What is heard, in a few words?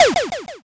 shot